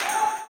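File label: tambourine, 160; Spectrasonics; Vocal Planet; Blues Soul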